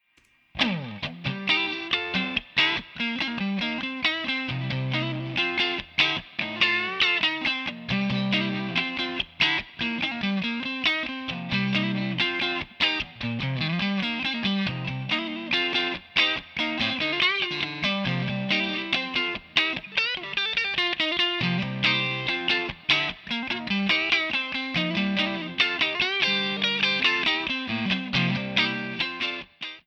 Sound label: Groove Drive guitar